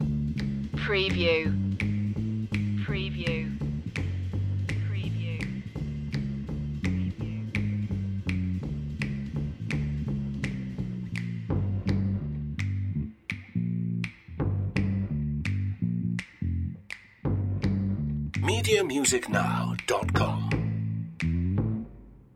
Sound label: fixin to score loop